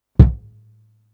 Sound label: Kicks; Touch Loops; CosmicFunk